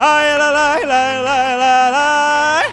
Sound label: SUNG ROMANI VOX; Best Service; World Colours